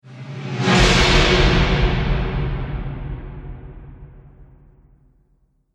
Cinematic Impact; Miscellaneous Impact Sound Effects

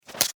Household Fly Swatter Hit; Cleaning

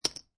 Poker Chips Dropping On Poker Chips

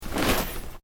Industrial Factory Bag Metal Pieces Picked Up; Various industrial machines; Machinery (Sound Effects:Machines)

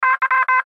Radio Morse Code; Morse Code Letter Y; Morse Code (Sound Effects:Electronics); Blastwave; Warfare

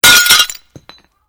GLASS SHARD SMASH

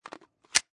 Pistol Semi Auto Clip Inserted Full; Pistol: Semi Auto, Clip inserted, full. Version 2; Gun Handling Sound Effects; Lynne Music

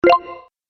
Positive Win Mini Bonus, Video Game Sound Effects; Twistedtracks

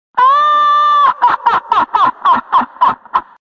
Laugh Toybox, Male laugh; Laughter Sound Effects; Sound Effects:Voice; VoiceBox

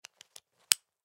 Baby Rocker Snap Buckle Shut; Miscellaneous Cartoon Sound Effects

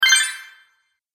Magic Purchase Delay Ding; Cartoon Sound Design Sound Effects

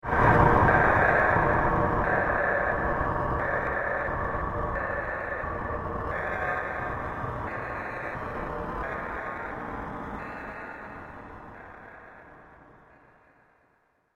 Falling Towards Hell, horror sound effect; Andrew Fleming; Twistedtracks

